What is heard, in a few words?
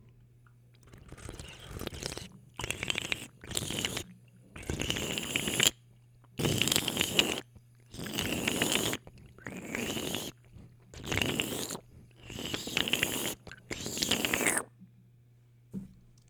Slurping Water